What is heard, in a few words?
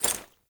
Generic Footstep; Warframe; Uriel